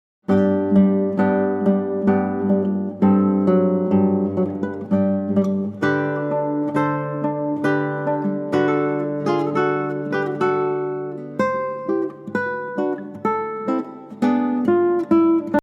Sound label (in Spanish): minueto en sol mayor